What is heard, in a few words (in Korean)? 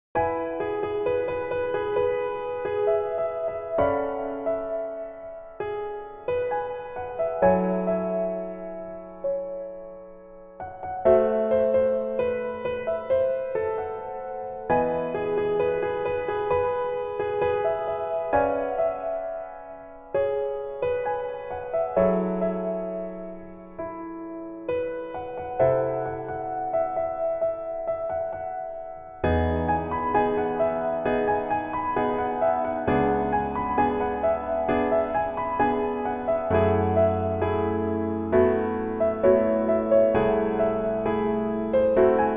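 Piano version 멜로디MR -1키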